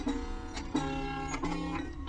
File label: sitar synt diff